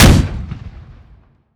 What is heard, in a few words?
Cannon Mortar; Weapons; DCS